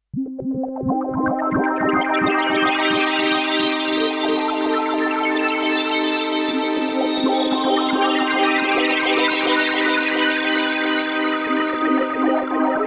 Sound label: minimax pads; plugiator